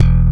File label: bass e2